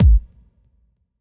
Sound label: Kick